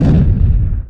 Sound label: explosion box; Swift Cats